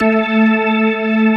P-ORG2 A3, ORGAN; AKAI S-Series CD-ROM Sound Library VOL-8

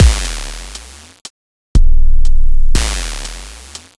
Robot Thunder, Modular Electronica

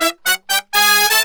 BRASS SALSA; Best Service ProSamples vol.14; AKAI

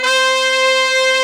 BRASS, Brass Bones, Brs Layer

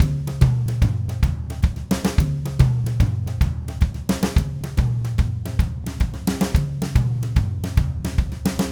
Red Maple Groove 110, DW Red Maple Kit

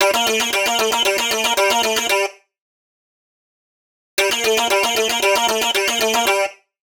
115 G Seq; Zero-G; Future Wave

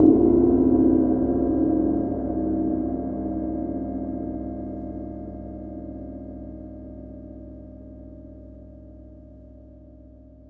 Cymbal Hit, Impact Drum Hits; Sonic Foundry (Sony Creative Software); The Electronic Music Manuscript Collection

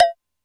Lo Synth, Alesis D4 drum machine

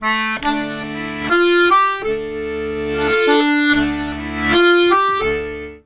Accordions; USB Soundscan vol.40; AKAI

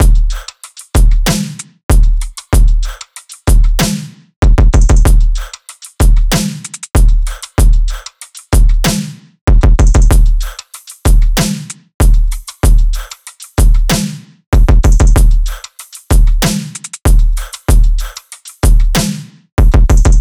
loop full 95; Seismic Loops

